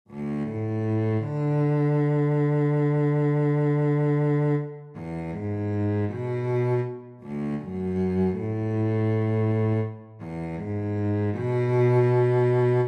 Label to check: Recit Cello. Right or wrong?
Right